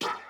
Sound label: breakbeat